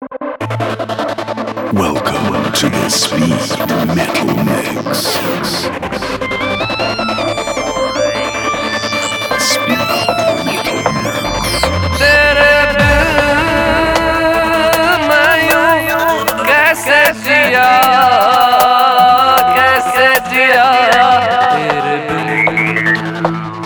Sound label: Speed Metal - Disco